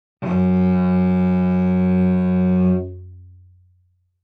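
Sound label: Cello arco fortissimo sul C Gb2; MIS Pitches - 2014; Strings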